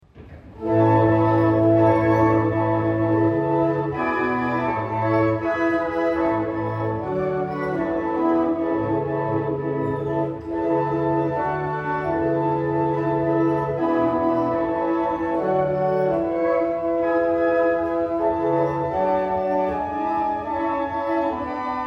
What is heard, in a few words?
Abingdon, barrel organs; Norton